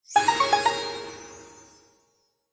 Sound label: SFX Bonus Summary, SLOTS; Premier Night